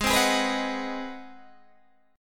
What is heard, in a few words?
Ab 7th Sharp 9th Guitar Open G, chord